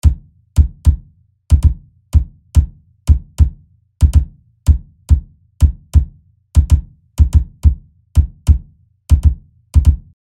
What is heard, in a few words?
new orleans kick drum